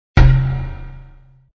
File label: Klak Open, flip